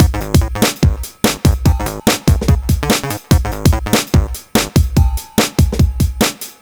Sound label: 145bpm; Nu Dance Electro; Zero-G; Soundclash; Combi Loops; Inline Jam